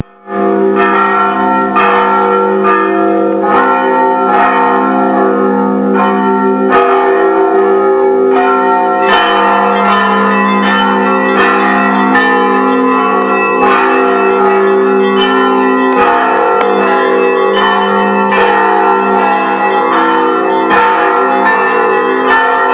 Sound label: bells at novgorod